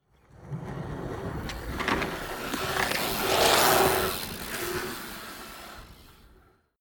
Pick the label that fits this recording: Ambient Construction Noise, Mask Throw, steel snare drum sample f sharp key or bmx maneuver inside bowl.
bmx maneuver inside bowl